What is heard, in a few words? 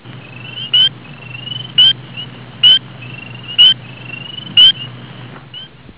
peeper, frog